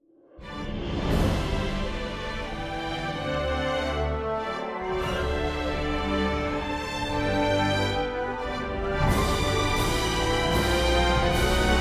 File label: orchestral